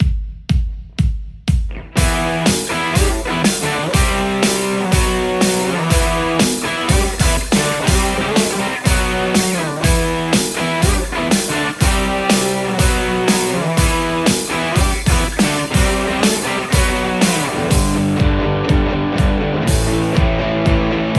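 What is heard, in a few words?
Telecaster; aradaz crunch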